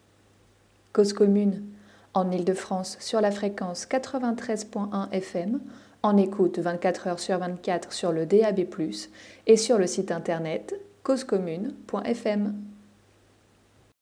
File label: jingle